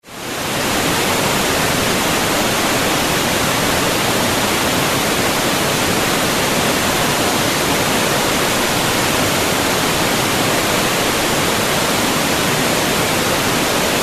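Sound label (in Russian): gornyi vodopad, mountain waterfall; Шум разных водопадов для монтажа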